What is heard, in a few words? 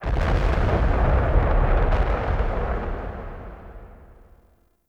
Gas City Distant Cloud Clap Explosions; Warframe; Corpus Gas City; Ambience